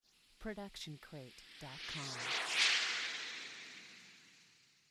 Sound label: jet through clouds; soundscrate